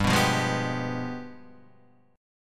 G 7th Flat 9th, Guitar, Open D

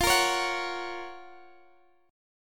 Gb Major F; Listen to Gb/F strummed

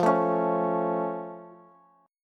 F Augmented, Bass; strummed; down

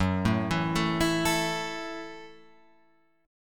Gb Minor 7th, Guitar Open F; arpeggiated; JGuitar

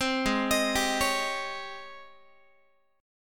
Ab Major 11th Ab Guitar; AbM11 arpeggiated; 15,16,18,17,17,x

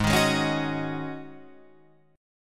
Ab 7th Suspended 4th Ab down Guitar Open F, Chord; Ab7sus4 strummed

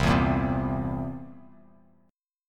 C 7th C down, C7; strummed; JGuitar

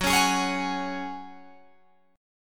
F sharp Suspended 2nd, Guitar Open G

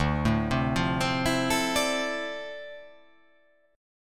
D Minor 9th, down

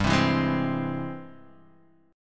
G sharp Augmented Major 7th G; G#+M7/G; strummed; JGuitar

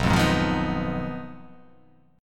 B 6th Flat 5th B down, chord

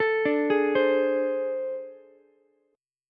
A Minor Major 7th Double Flat 5th, down, Ukulele; arpeggiated; JGuitar

